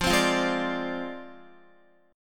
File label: F Minor 6th F down Guitar; strummed; tuning 4E,4A,5D,5G,5C,6F